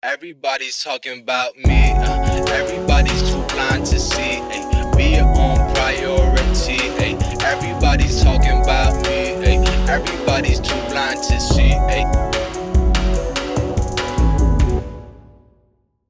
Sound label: Tone